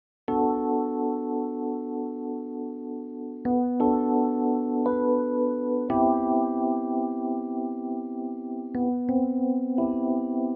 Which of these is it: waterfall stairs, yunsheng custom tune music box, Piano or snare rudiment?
Piano